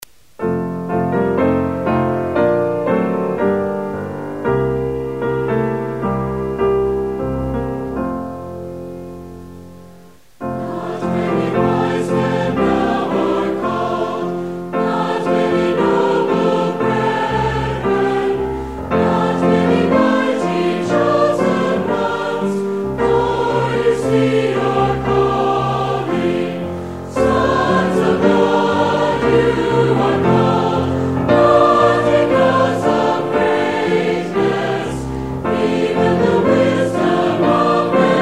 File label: hymn, choral accompaniment; bible hymnal